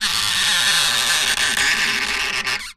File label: foley wood balustrade turn squeak; zapsplat